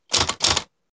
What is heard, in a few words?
Typewriter New Line